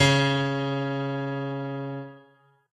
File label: piano; pianojs